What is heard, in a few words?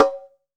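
bongo low, GM percussion kit; AKAI S-Series CD-ROM Sound Library VOL-5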